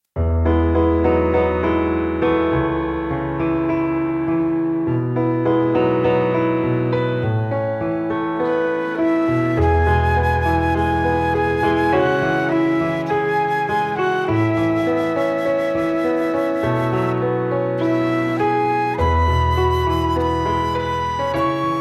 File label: Instrumental; Were You There